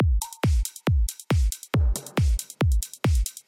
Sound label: PSY TRANCE